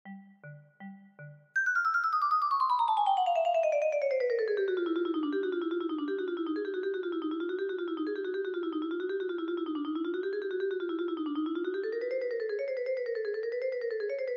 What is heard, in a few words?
vibraphone; Piano; Flight Of The Bumblebee